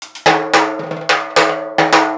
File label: 110 HipHop Timbales; Percussion